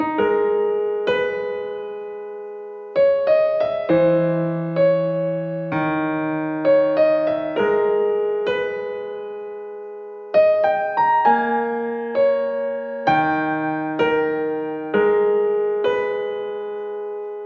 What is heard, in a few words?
instrumental